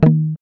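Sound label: GUI D#, acoustic guitar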